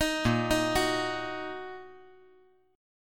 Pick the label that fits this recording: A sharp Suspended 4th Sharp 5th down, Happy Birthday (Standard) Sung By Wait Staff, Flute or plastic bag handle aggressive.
A sharp Suspended 4th Sharp 5th down